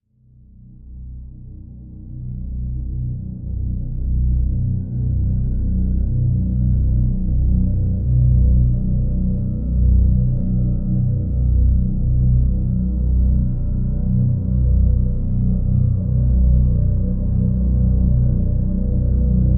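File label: drone B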